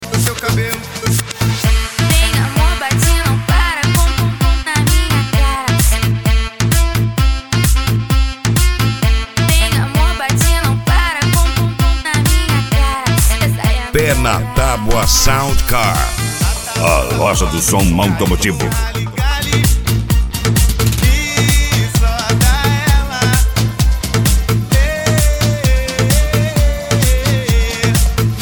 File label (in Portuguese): sound car carnaval